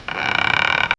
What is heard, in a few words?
single slow wooden step, creaks